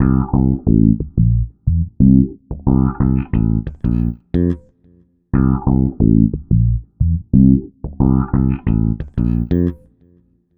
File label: Filtered Funky Bass, Electric Bass